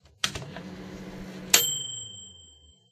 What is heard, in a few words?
machine ding; Sony